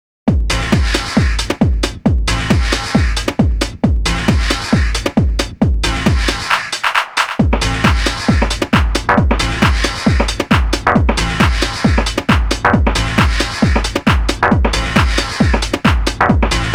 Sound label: Boom Box Track loop